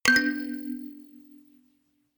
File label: notification kalimba rattle third up